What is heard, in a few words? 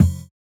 Kick, jungle breaks drum sounds